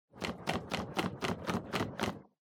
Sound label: Sensu Fan